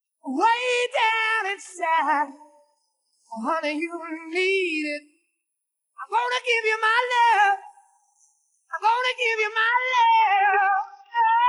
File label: vocals